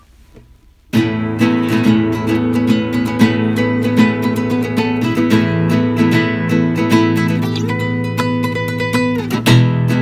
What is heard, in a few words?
Yamaha CS40; Аудиозапись Yamaha CS40-2